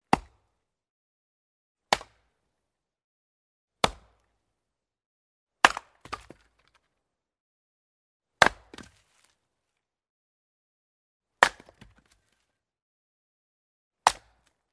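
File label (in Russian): звук рубки дров колка древесины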